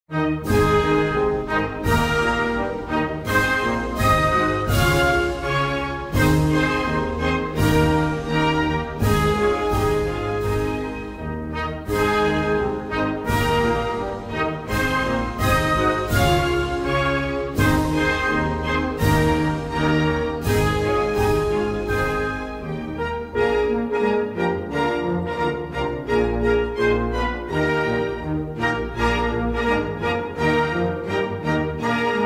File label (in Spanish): Himno Nacional de Samoa